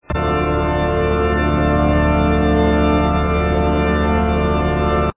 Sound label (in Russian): organ odinochnij